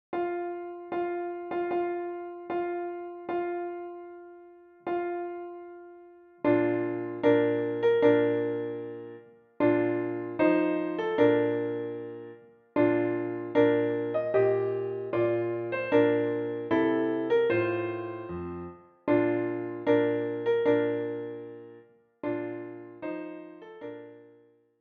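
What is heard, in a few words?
piano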